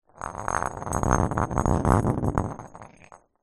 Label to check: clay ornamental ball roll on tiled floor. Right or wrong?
Right